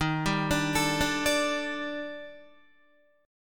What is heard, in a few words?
D sharp Major Sharp 11th, Guitar; arpeggiated; JGuitar